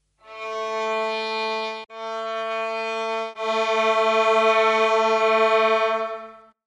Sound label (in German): geige; phi